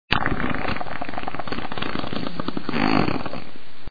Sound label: fart